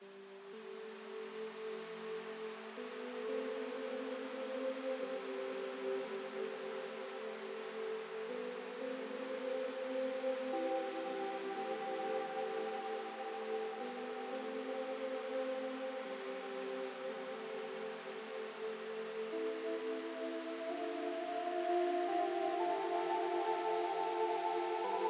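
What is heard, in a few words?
174 BPM A Minor Pad, DnB; Melodies; Loop Stems; Axis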